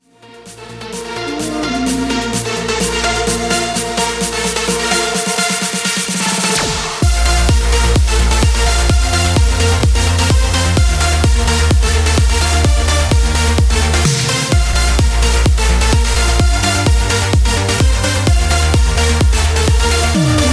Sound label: Festival Edit 128Bpm